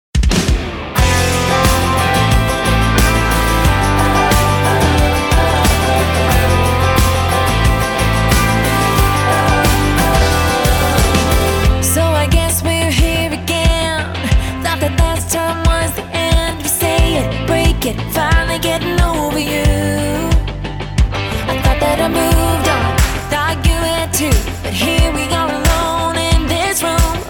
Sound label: pop rock